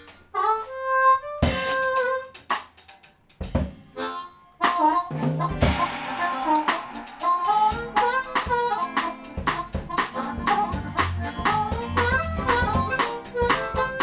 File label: Harmonica Jam